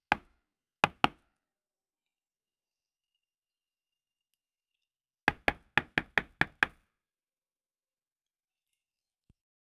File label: woodcutter cut the tree, axe